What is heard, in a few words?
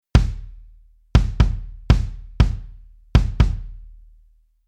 Rhythm Basic Velo Kick